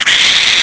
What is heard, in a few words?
bug angry; Blade of Darkness